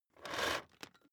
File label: vacuum cable drag out single